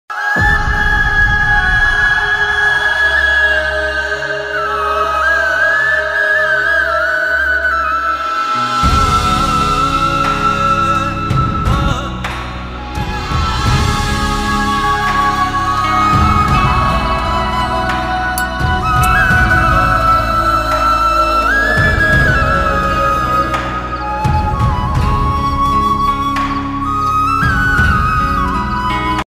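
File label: flute female version ringtone Pakistani Urdu flute romantics ringtone; ruposh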